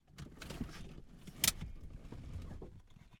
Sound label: pontiac trans am 84 seatbelt interior; ambeo; Sonniss GDC